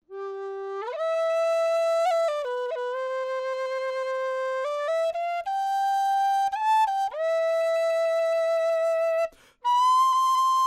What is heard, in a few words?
Whistle G Air